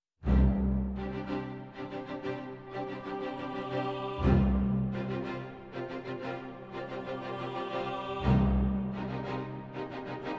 Building Tension

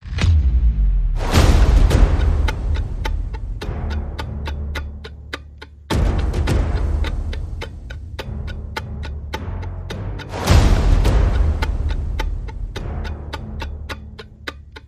Percussion and Effects Only

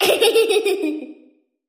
Vocals Innocent Child Laugh

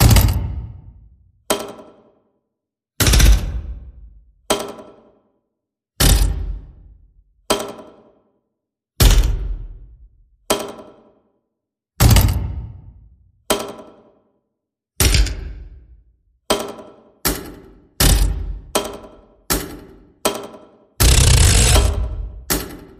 Clock

